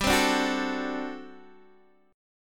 G Major 7th Suspended 2nd Suspended 4th G down Guitar DADGAD